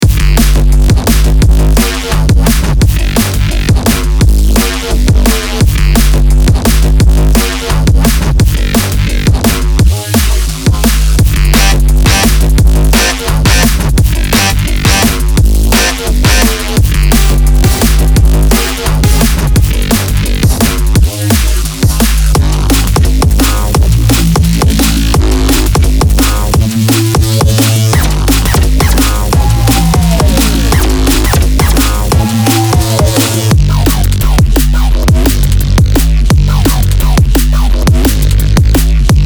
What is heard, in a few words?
Serum Neuro; Black Octopus Sound; Blackwarp